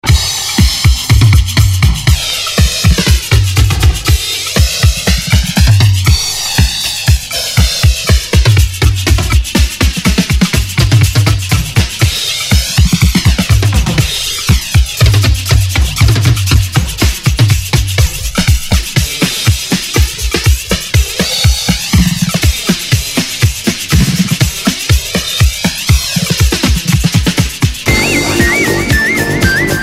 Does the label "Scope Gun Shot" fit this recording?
No